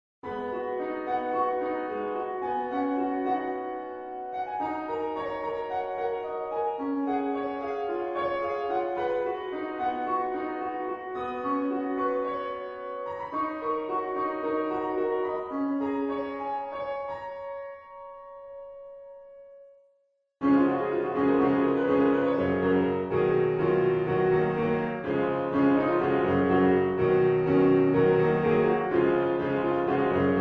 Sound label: Piano Cover